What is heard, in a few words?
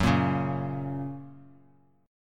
Gb Major Gb down; Gb strummed; JGuitar